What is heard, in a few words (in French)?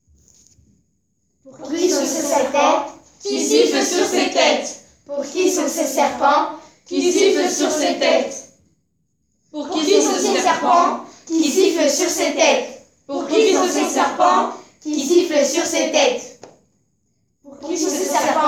Virelangue serpent en 2 groupes